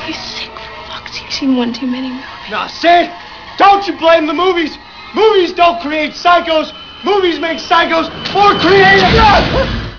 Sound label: scream